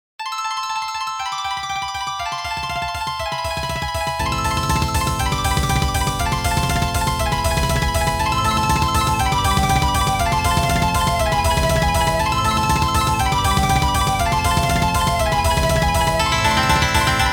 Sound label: battle